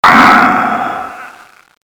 resident death; cso

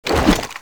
m2 draw

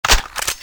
clip in, weapons; thanatos7

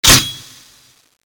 charge, weapons; balrog11

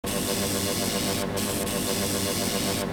stun rifle idle; weapons

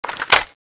clipout; weapons

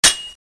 m249 clip out

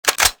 psg1 bolt pull

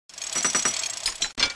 draw; thanatos7; weapons

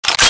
boltpull; weapons

